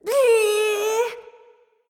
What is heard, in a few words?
tongue